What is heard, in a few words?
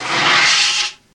Fire Extinguishe; fire extinguisher